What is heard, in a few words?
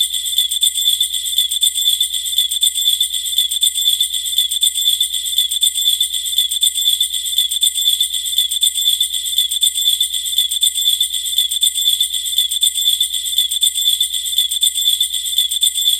Sleigh Bells 120 BPM; перкуссии percussion loops